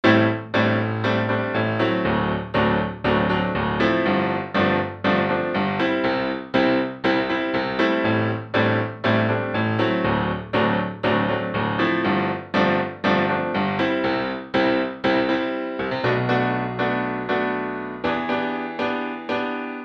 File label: pop 1999 USA